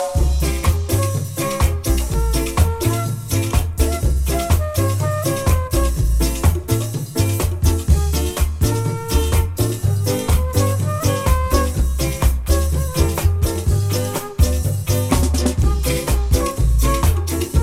ska

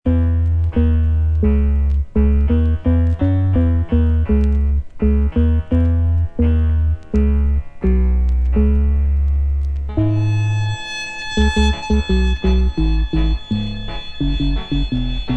instrumental

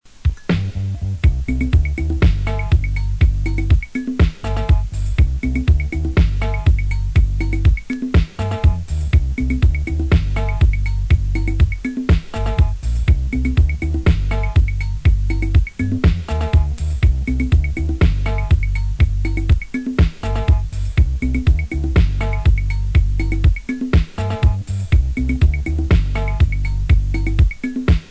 tribal beat, Drum Beats

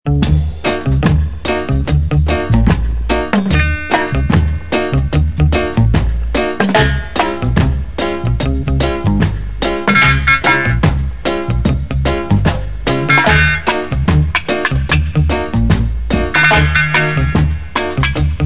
little flute chant

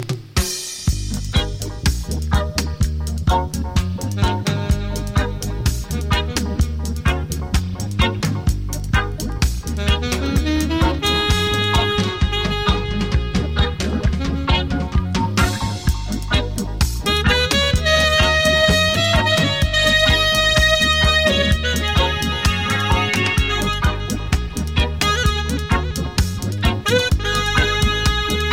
horn

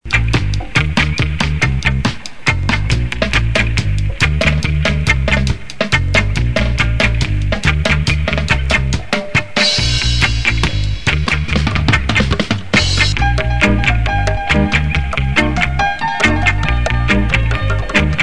bass

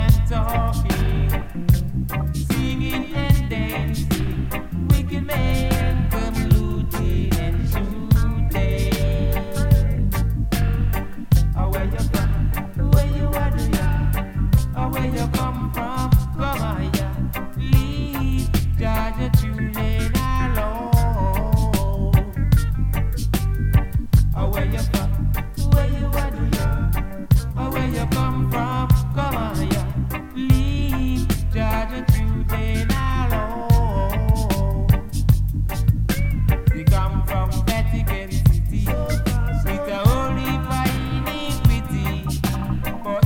bells ringing

